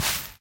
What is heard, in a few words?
gold collect